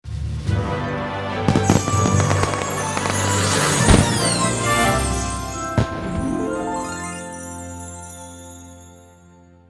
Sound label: MUSIC Quest End; Twilight; Equestria Girls